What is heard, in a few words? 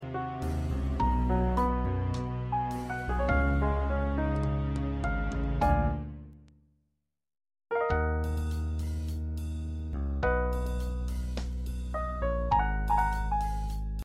Accompaniment